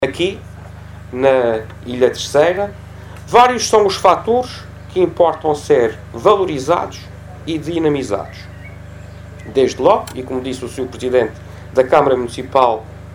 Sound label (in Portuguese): Angra Jazz